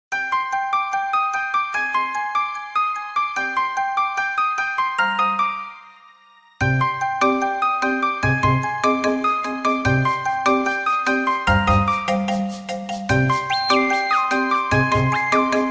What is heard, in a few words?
Nokia Real Tone Piano